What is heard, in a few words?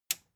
metal button tiny push disable